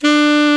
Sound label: SAX A. MP D, Alto Short